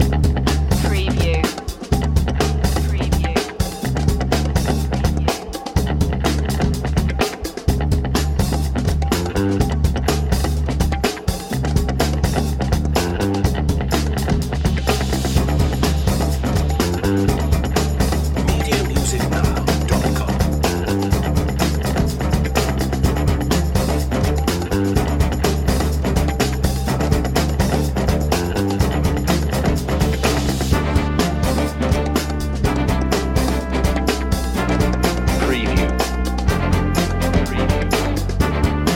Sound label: b-boy hustle